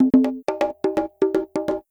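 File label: PERCUSSION DISCO 125; Drum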